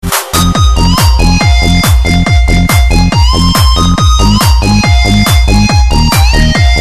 Dynamic Tone

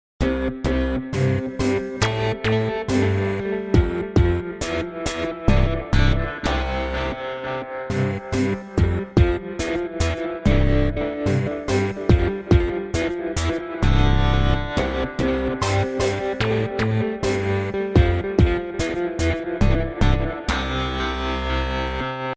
Twinkle Ringtone

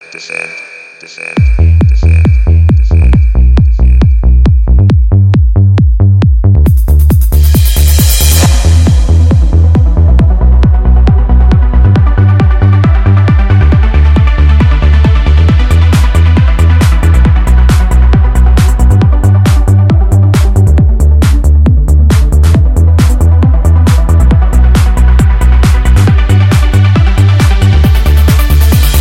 Maximum Beat

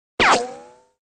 Bullet Ricochet

